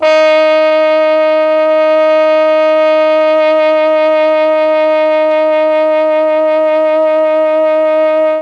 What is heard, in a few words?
D#3 TROMBONE, Mellotron; CHMB TRMBONE; Mike Pinder - Mellotron Archive CD